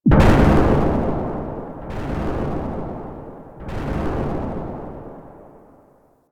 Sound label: Arcade Misc; Warframe; Ambience; Nineteen Ninety Nine